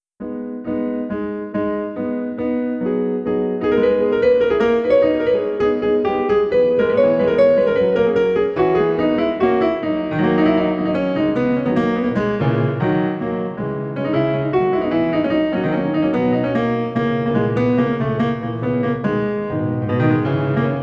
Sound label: Fast Melody